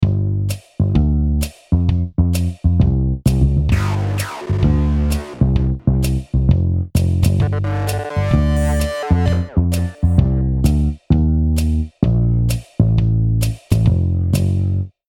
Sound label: Jazzy Electronica, ringtones